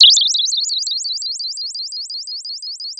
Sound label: Sound of a bird tweeting nicely